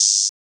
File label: Open Hat, Cymbals; drumkits; fl studio; bvker drumkit